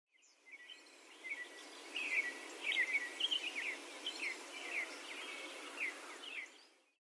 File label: Birds Wood